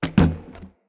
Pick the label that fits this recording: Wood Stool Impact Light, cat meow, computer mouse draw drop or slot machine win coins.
Wood Stool Impact Light